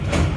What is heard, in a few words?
door Close, Metro